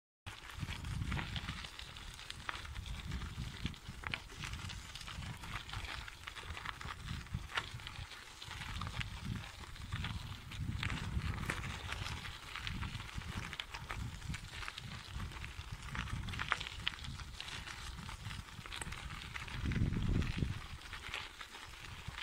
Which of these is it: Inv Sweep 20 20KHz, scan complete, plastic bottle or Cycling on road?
Cycling on road